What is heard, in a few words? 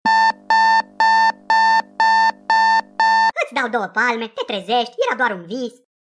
Roboti Alarma